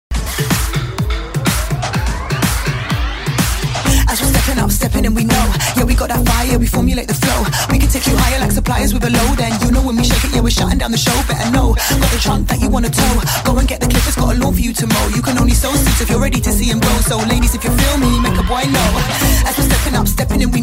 instrumental

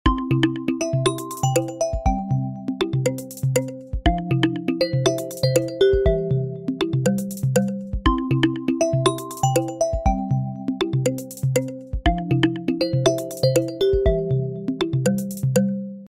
Nokia remix bounce ringtone

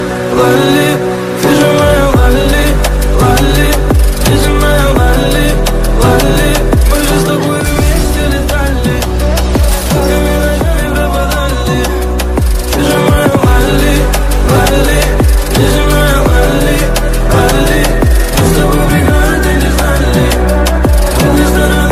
popular english ringtone

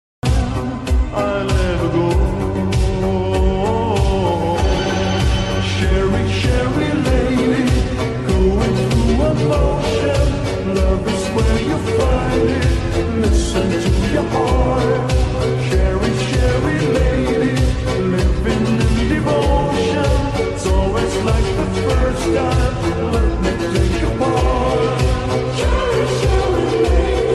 slow thai ringtone

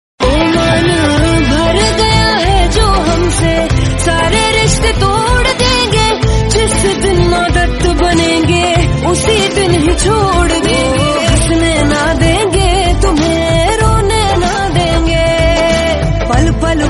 sad hindi song tone ringtone; bollywood

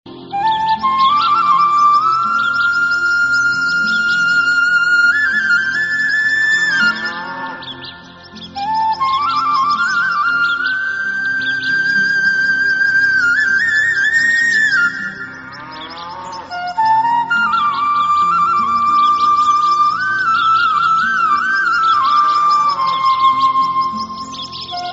krishna flute ringtone

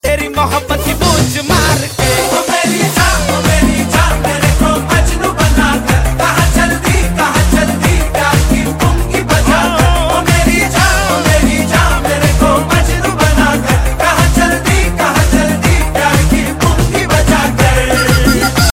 pungi ringtone

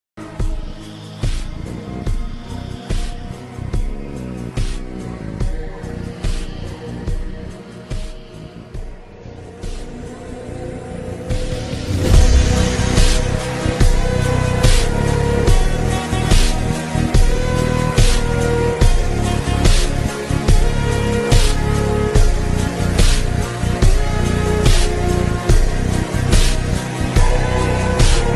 instrumental ringtone; english ringtones

